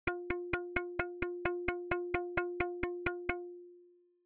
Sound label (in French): Sautille